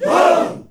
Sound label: GROUP YAH, SHOUT; Spectrasonics; Heart of Africa 1; CH. SHOUT1